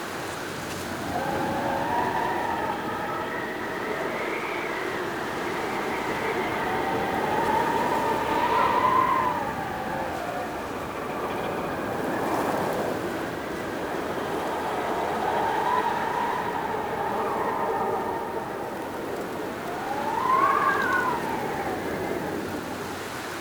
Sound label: Cloud Jump Loop; Warframe; MonkeyKing